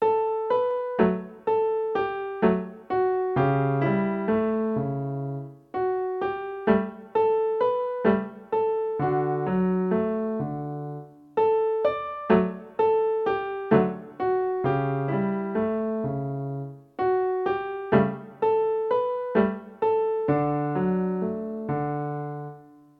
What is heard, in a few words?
latin serenade in d major